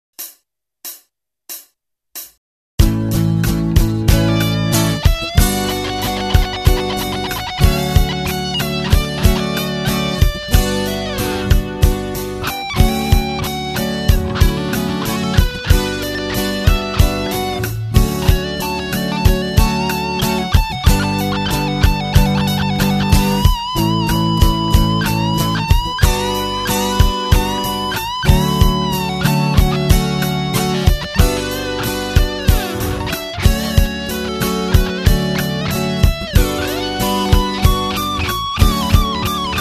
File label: solo